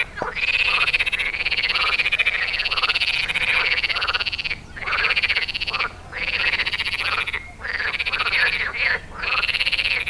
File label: Pelophylax perezi; Saint-Symphorien